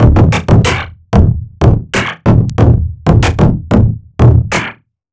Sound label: Dirt Dry, Drum; Sonic Foundry (Sony Creative Software) - Future Retro Dance Excursions